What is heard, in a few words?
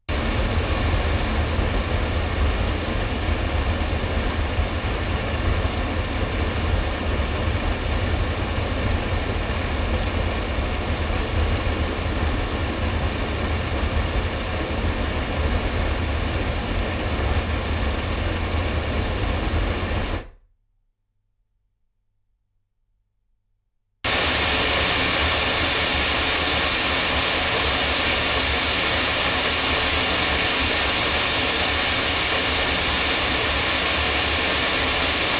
Oktava MK012 omni loudspeakers